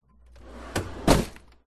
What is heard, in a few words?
freezer internal sliding tray compartment plastic slide